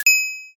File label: register